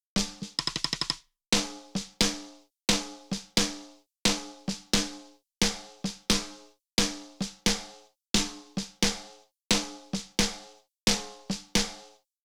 Snare 1073LBEQ Before; スネアサウンド試聴; 元の素材; Neve 1073LBEQ

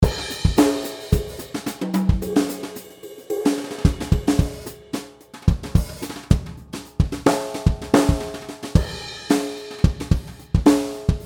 Country; BFD3 Preset

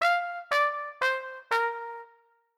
trumpet velmax; swam

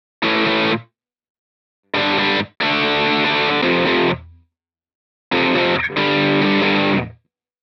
Jam Riff; spark Mini

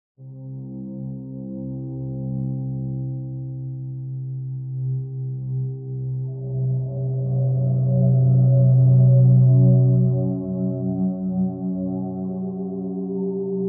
drones on a dull silver platter wet; aas; objeq delay

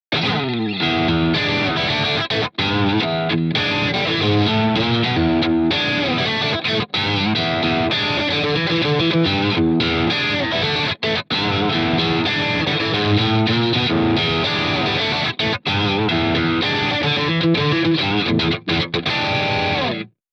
Vintage Drive Strato Bridge

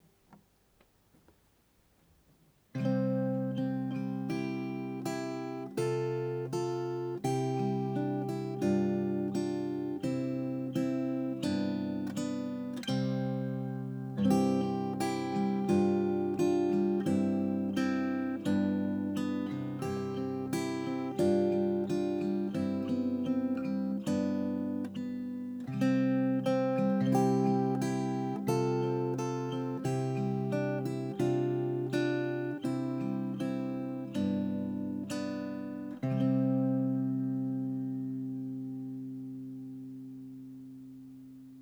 12 Gt melo Bridge; ギターソロ：サウンドホール正面めがけ、下側からマイクを向けたもの

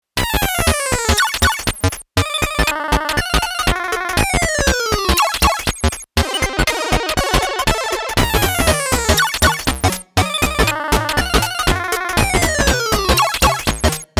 Omnisphere 2 Retro

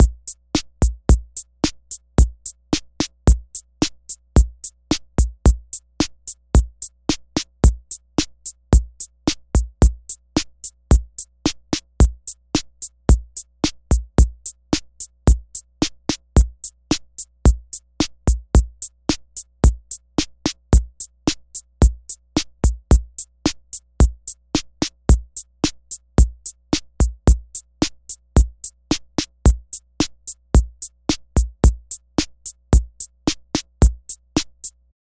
delay dry; aas; objeq; richard devine signature

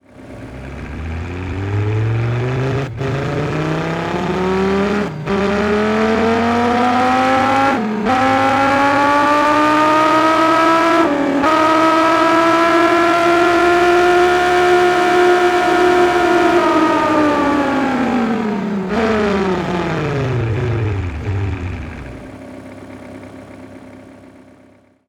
Audi R8

